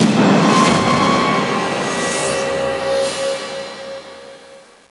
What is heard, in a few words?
Game Over